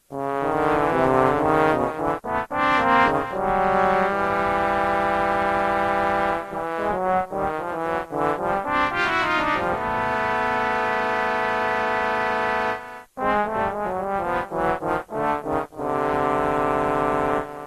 basement trumpets

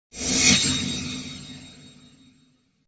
sharp sound

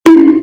spatial bonk bonk

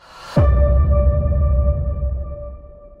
combo piano

